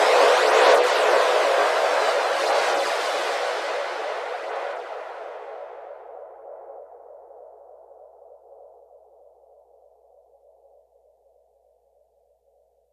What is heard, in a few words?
WHITE NOISE